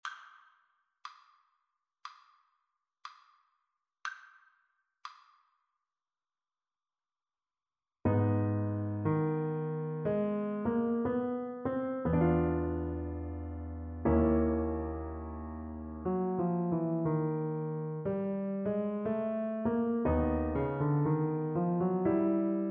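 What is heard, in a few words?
happy jazzy birthday alto sax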